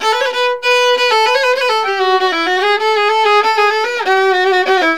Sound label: VIOLIN, Balkanish Traditions; USB Soundscan vol.63; AKAI